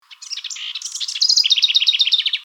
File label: male song example